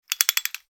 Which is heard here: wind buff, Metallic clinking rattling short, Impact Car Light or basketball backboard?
Metallic clinking rattling short